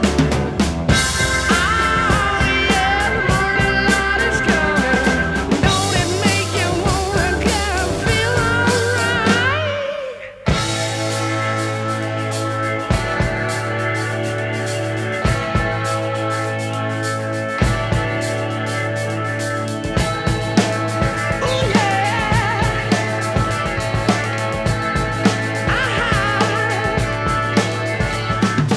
rock

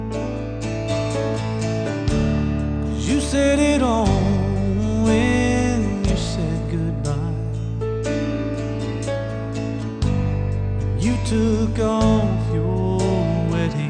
country

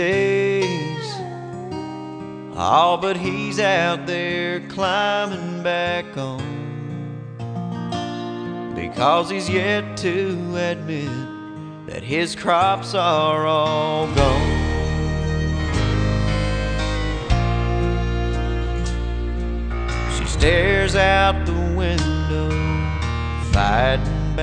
country

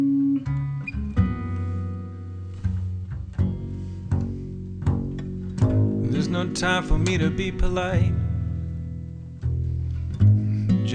blues

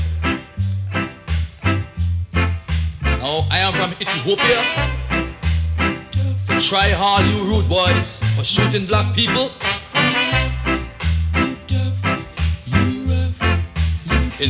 reggae

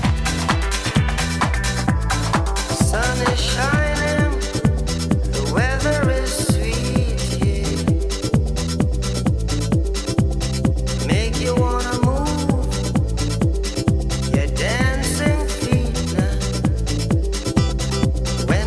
reggae

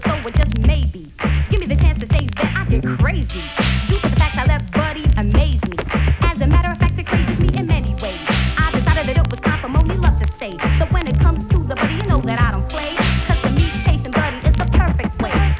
hiphop

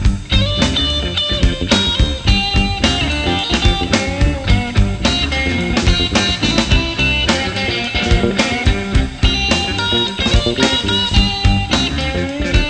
blues

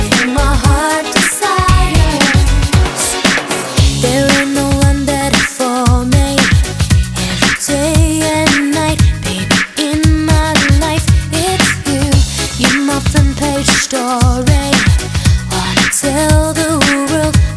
pop

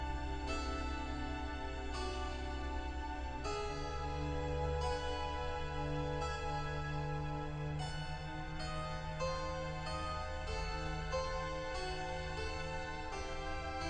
classical

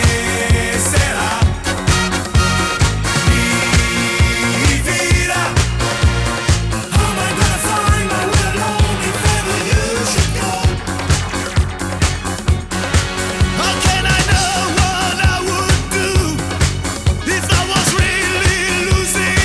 disco

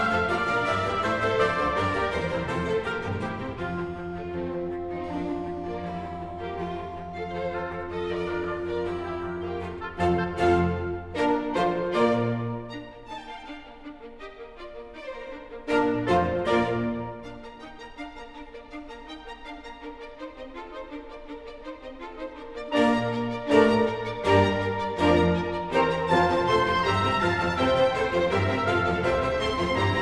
classical

